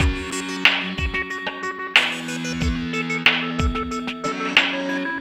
Beats, Synchro Funk

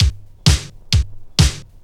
drum 130 straight; Techno Trance Essentials

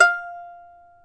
F4 HASAPI; AKAI S6000 CD-ROM; WorldSounds

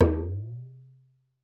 African Drums; DON-DON LO; E-MU Formula 4000 Series Vol. 4 – Earth Tones

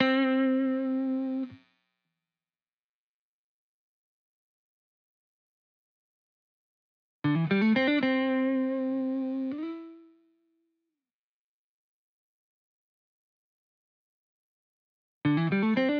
Guitar Bpm120 Key A minor, 70s Guitars

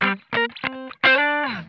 GLIDE, Funk Guitar; Zero G - Funk Guitar

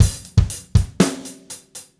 Gretsch groove 90 BPM, 1967 Gretsch Kit drums; 120 BPM; Sonic Foundry (Sony Creative Software); Vital Drums

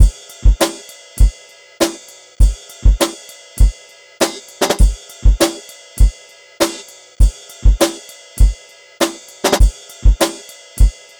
Drum Kit, Electro Lounge; Sonic Foundry (Sony Creative Software); 100 Rate Plan

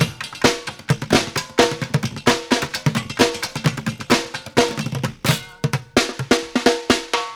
Street Groove, Ambient Grooves

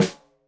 Snare Stereo, Drums; Deeply Sampled Drums

